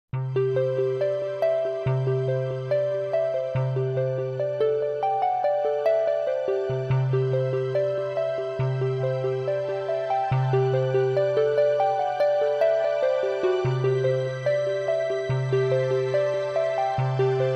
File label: Medium Energy Delicate